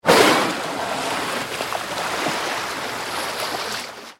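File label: orca; kosatka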